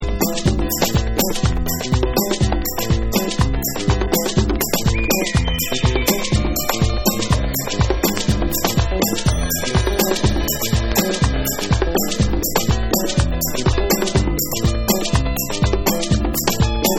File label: Tribal Winds